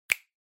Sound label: Finger Snap Foley, Dry